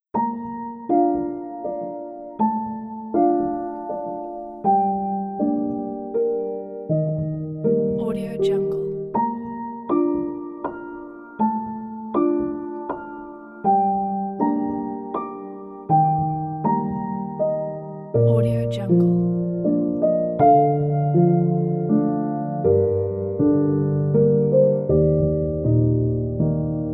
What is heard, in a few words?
soft clouds piano; animapiano